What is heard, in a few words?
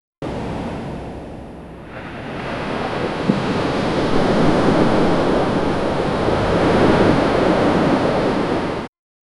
a whale jump into the